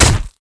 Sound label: sword hit; matmos interactions